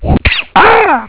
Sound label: DESTROY